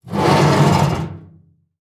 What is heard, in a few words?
Foley Movement Aluminum Shelf Drag On Cement Short